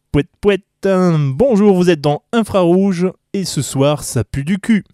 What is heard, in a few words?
jingle